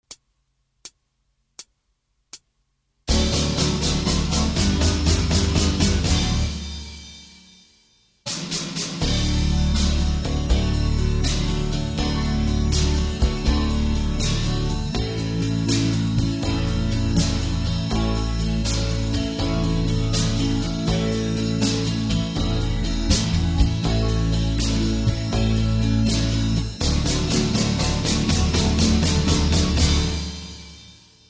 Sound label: D No Lead Guitar, backing tracks